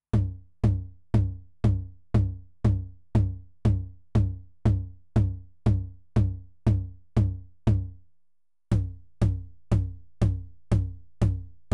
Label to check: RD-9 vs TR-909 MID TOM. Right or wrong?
Right